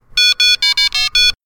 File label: happy; labpro